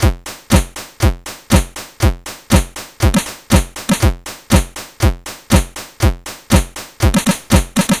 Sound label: C64 Beat, chiptune drums; Commodore 64